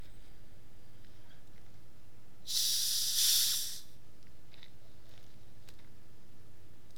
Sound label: Fizz